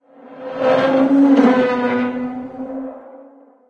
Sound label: metal groan